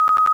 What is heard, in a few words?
notify